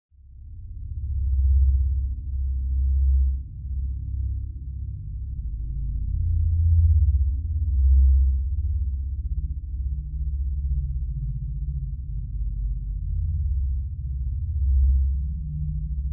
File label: Bed Fluctuating Meta